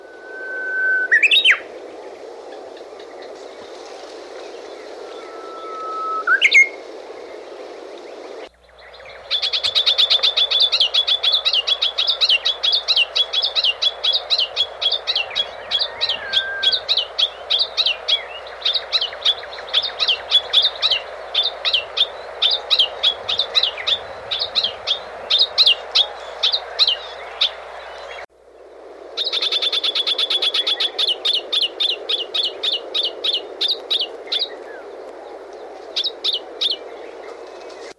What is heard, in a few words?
Japanese Bush-Warbler